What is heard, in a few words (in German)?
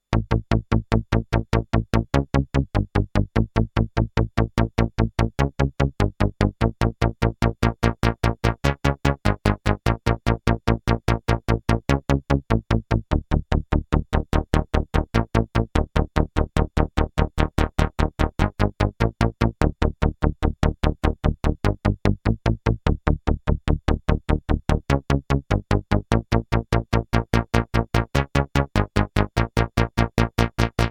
Analog Sequence analog moduliert analog transponiert; Analog Solutions Ample